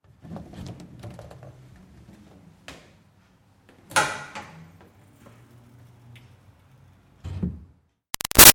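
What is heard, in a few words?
Door Wood Push Bathroom Open-Close Light; Miscellaneous Doors; Sound Effects:Doors; Big Room Sound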